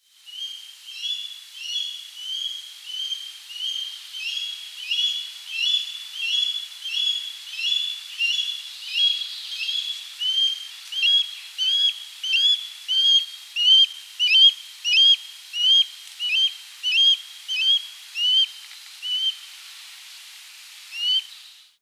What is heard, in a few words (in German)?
Fischadler Balz